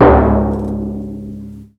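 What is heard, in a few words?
timpani; Ragga & Dub Reggae; PERCS KIT; USB Soundscan vol.27; AKAI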